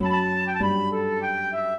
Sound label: minuet, flute harp; Mozart